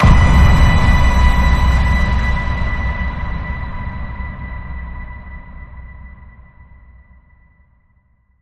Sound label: Ping Frequency, B